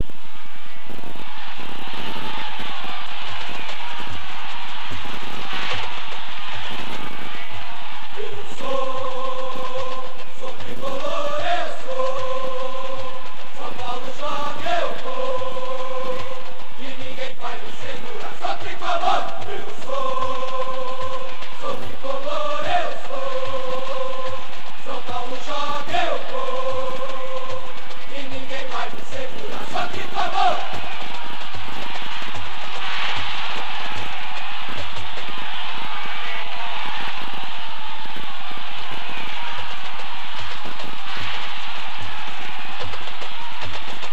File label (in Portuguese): gritos de guerra atuais; ritmo da vitoria